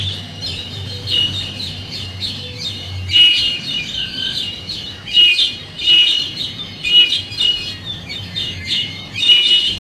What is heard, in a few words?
peaceful india soft